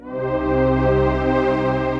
Dark Chord Pad; Synth Pad; Ableton; Factory Packs; Synth Essentials

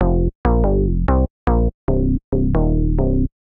SynBass (F) 140bpm; Music Loops; Stub Nub SynBass; Ableton; Factory Packs; Singularities

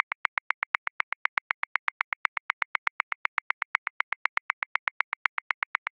Straight Six Rock 6/8, Drum Booth, Grooves; Ableton

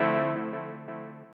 175 D&B Synth Ebm; Dance DJ

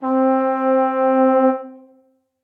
Long Pitch Bend, Brass Quartet; Ableton; Spitfire Audio; Individual

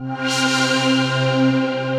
Event Choir, pad; Ableton; Factory Packs; Punch and Tilt

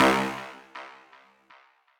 Bass; Stingbat; Ableton; Factory Packs; Skitter and Step

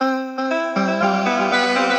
Hocketing Voice, Transformed Voices; Voice Box; Chromatic Voices

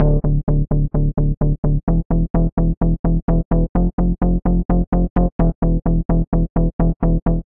Bass (D) 128bpm; Music Loops; Keys of Life; Ableton; Singularities

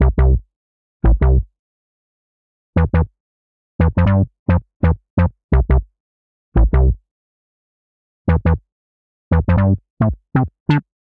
C Major 87 bpm, Bassline; Tonal; MIDI Clips; Tango Plush; Drive and Glow; Ableton; Factory Packs

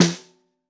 SN2 Bottom, Snare; Ableton Foundation; Snare Designer

